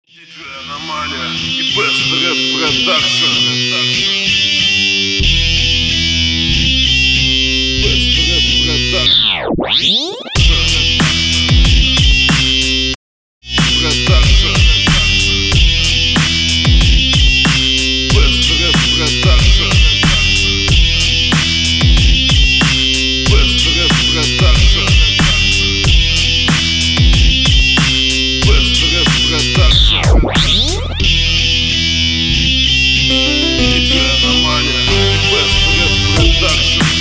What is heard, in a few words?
Rap production